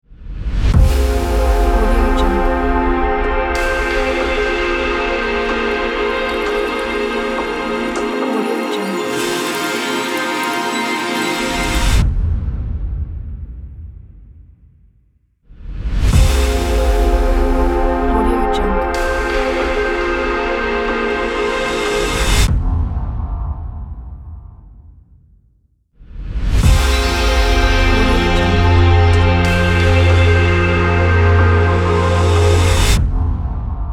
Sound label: brighter logo; boomopera-sfx